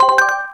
Toad Ball; Wii

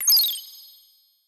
Star Rod Fire; Super Smash Bros

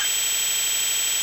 Propeller Suit Drill Down; New Super Mario Bros. Wii

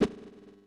Whoosh; Super Smash Bros; Link

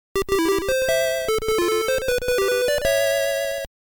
stage clear

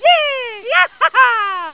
yay yahaha; gba; Mario; mario kart super circuit

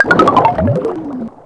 Bone Fish; New Super Mario Bros. Wii

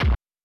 Floor Hit; Super Smash Bros

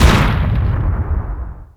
Giant Icicle Fall

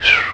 mario snore; mario 64

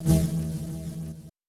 Beam Sword; Super Smash Bros

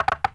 Spinning Platforms

